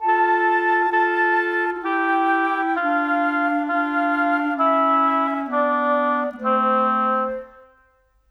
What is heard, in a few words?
Winds, Orchestral; Sonic Foundry (Sony Creative Software) - Orchestral VOL-2; Modern 38